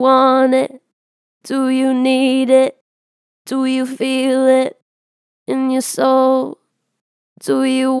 Str Vib Do you want it, Vocals, Natural Vibrato Strong; Harmor Vocals